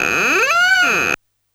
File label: Door Squeak, Yamaha PSR-E323 SFX Kit